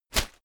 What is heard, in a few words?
whoosh shredded paper quick